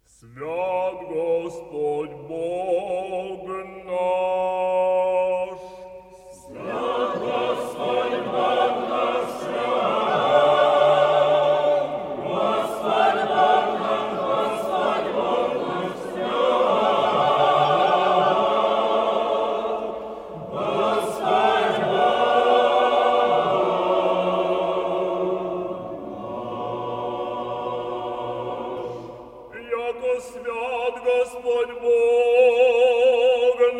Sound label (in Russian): Архиерейский хор Свят Господь Бог наш; Одесский Архиерейский хор; 6 глас КПЛ